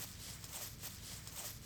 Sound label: Footsteps